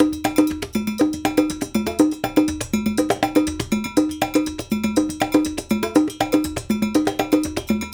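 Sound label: 120 bpm, Congo; Spectrasonics; Supreme Beats; World Dance; Isle Congo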